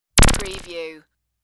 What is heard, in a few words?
Squiggle